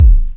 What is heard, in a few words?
KICK, Hip Hop